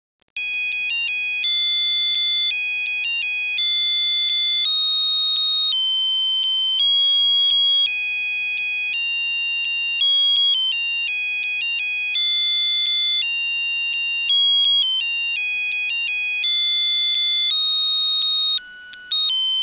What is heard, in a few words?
Silent night, Melody song